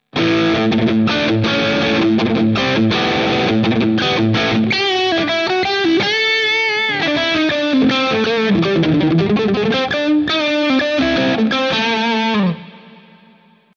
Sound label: brit JM pre; PODxtLive